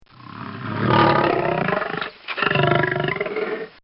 Lion-Growling, Breathing, Pacing; Lions; Bobby Cole

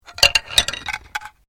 Bones Human Move; Material components for constructing more complex sounds; Tapping (Sound Effects:Objects)

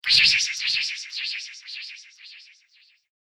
Air Twister Wind Spell, Magic; fantasy sound effect; role playing games, magic videogames

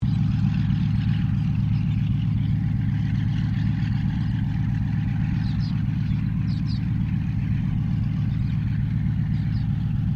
AIRCRAFT CA-13 BOOMERANG IDLE DISTANT; Airplanes

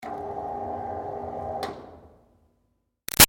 Projector Screen Med Far Up Short; Offices

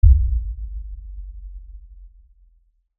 LFE Hit, Lfe Hit Low Bass Impact; Blastwave

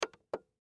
Toy Cd Player Push, Toy Cd Player Push Button; Miscellaneous Cartoon; Blastwave; Foley

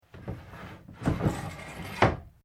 Door Sliding Interior Small Cupboard Screen Open; SoundEffects Foundation2

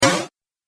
Arcade Game Laser Impact; Video Game Sound Effects; Retro Inspired Sound Effects - Think Nintendo, Atari, C64, Etc.; Andrew Fleming

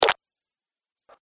Cartoon Slime Splat; Slimy Sound Effects

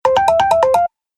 Message Notify Sound, Dong

